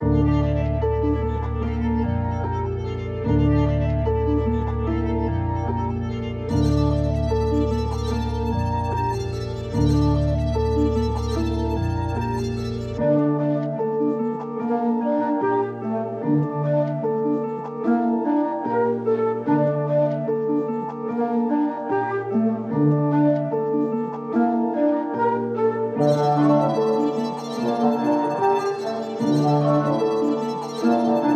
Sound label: 148 BPM D Min; Cymatics; Utopia Sample Pack